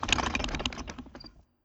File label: Tank Motor